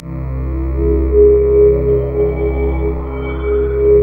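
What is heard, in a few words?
synth Morpheus; Sound & Vision - Gigapack II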